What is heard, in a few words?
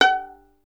STR VLN, Solo Instruments; Miroslav Vitous